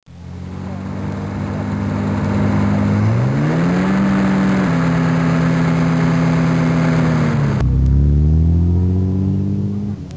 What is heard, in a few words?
Jaguar Model SS-100; Vehicles; Jaguar 1936 Model SS-100